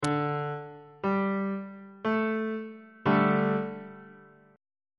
f double sharp suspended 2nd 2nd inversion

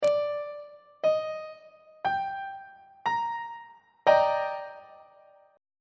d sharp major 7th 3rd inversion on treble clef

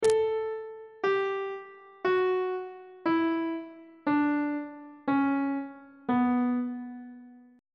c flat minor scale descending on treble clef

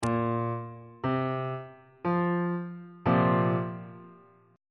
a sharp suspended 2nd chord

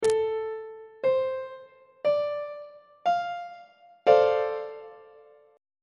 c double sharp minor 7th 2nd inversion; on treble clef

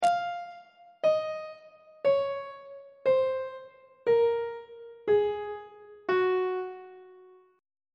f sharp lydian mode descending; on treble clef

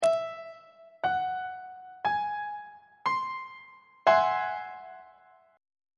a flat augmented 7th 2nd inversion; on treble clef

